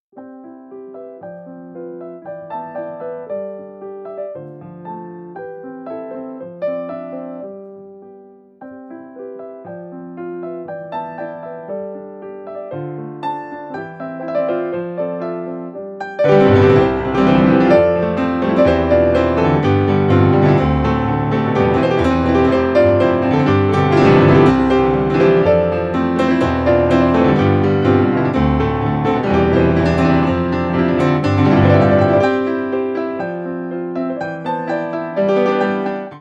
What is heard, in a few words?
Piano